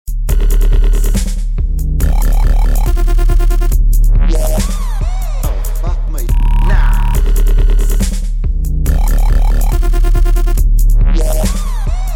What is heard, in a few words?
Beat